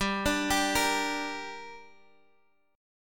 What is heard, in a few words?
G Minor, Guitar Standard; arpeggiated; x,x,5,7,8,6; JGuitar